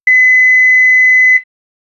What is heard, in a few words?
sci-fi